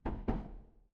door knock

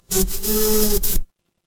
animal insect

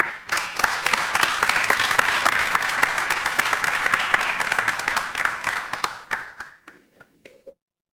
crowd applause

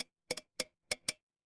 glass glass tap

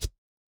ui drag

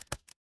office stapler

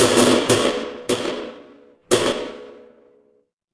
fire electric arc

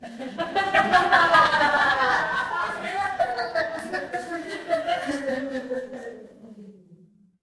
crowd laughter

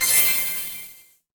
magic scifi heal

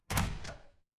door open

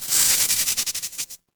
retro game power up

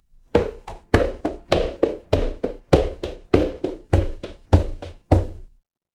footsteps stairs

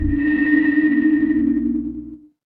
magic scifi alien

